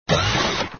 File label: SFX sound (spider swing); Red Baron